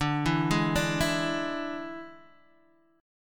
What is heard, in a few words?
D Suspended 2nd Flat 5th D, Guitar, Open D